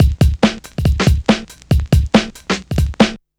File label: Breakbeat 140 BPM